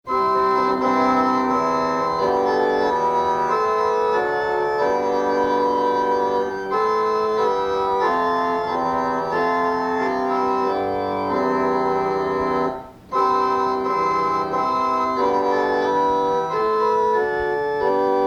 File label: Organ Music; A Mighty Fortress is Our God